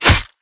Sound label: knife hit; Valorant